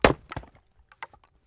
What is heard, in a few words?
mining wood; factorio; Factorio 0.14.18